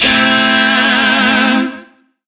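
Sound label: Shawzin Major Chord; Warframe; Lizzie Shawzin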